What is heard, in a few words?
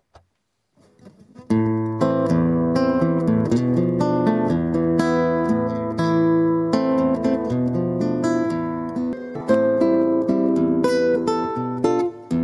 Solo